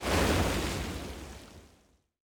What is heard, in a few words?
damage hits explosions, Warships